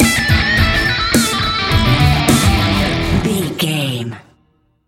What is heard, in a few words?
crazy sally stinger